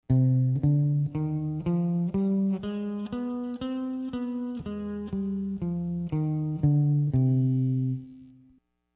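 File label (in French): gamme mineur harmonique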